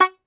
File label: Tenor Banjo, Plucked Strings